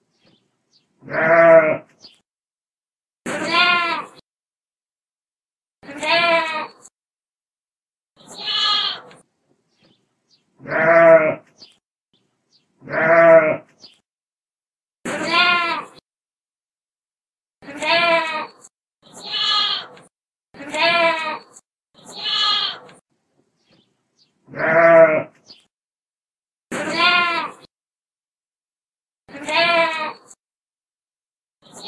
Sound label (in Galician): son cabra; animais